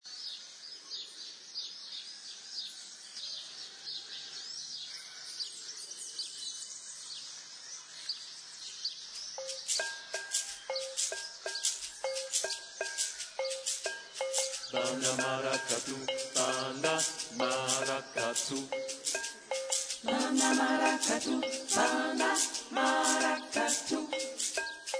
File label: banda, funky